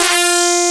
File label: AN SYN, SYN & LEAD; SAMPLE WAVE MIXING 2